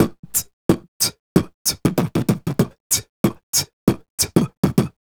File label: Beat Box, Hip-Hop and RnB Vocals